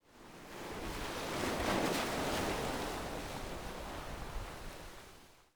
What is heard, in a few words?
ocean waves